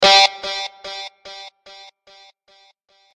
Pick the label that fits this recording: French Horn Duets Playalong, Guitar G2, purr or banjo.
Guitar G2